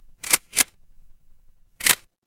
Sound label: office camera